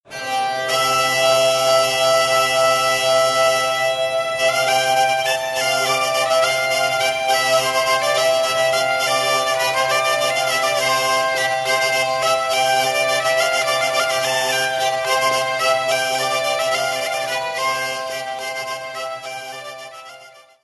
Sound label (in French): BOURREE - France